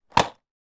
industrial plastic box of drywall screws put down on table